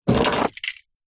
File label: transport car keys pick up from dashboard